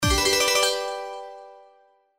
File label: Symbol Wins Scatter, SLOTS; Majestic India